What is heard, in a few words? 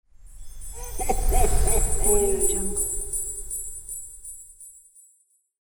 santa claus sleigh flyby